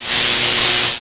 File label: zap; asciisec